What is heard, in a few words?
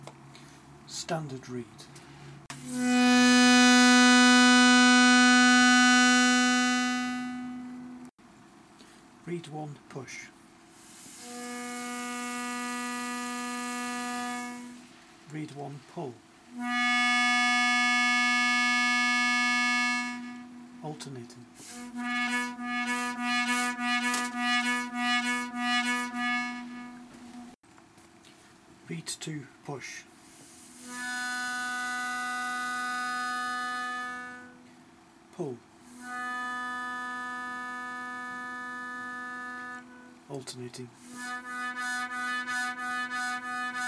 Bidirectional Reed Samples, concertinas